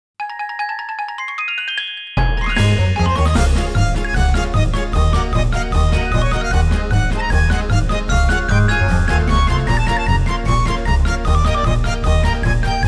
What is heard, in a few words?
Xylophone; Atelier Lydie & Suelle The Alchemists and the Mysterious Paintings Original Soundtrack; Overworked Xylophone